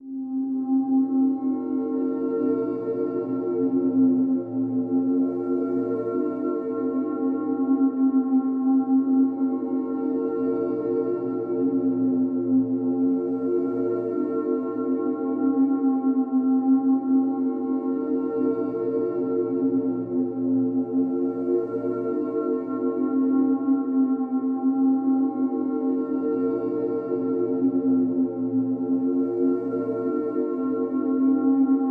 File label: Angel Breath